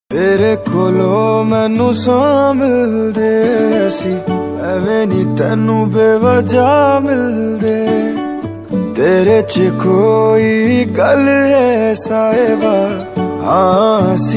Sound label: ringtone punjabi ringtone love punjabi ringtone